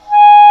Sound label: Eng Horn mf G#4; Digital Sound Factory Virtuoso